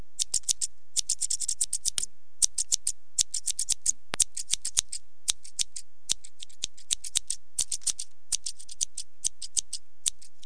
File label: scissors; Sound Effects Library 1.5